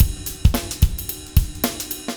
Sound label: LOOP, 70's Breakbeats; USB Soundscan vol.46; AKAI